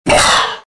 short movement sound

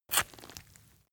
leather impact mud soft foam

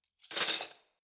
cutlery spoons drawer place

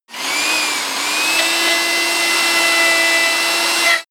sander on load light

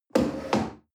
chair wood place slide hard

